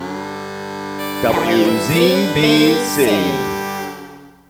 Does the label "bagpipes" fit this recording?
Yes